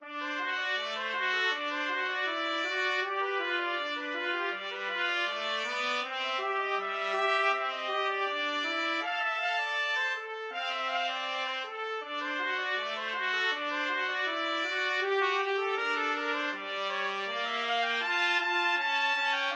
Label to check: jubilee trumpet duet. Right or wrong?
Right